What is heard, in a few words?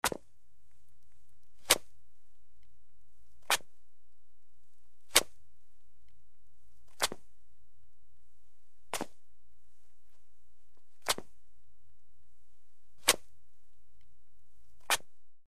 Footsteps Brick Scuf